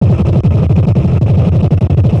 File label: Road noise cobble